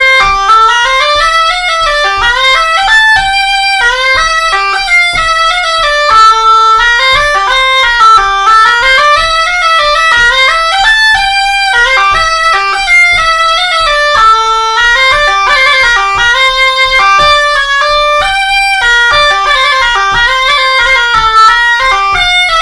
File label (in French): Valse; Valses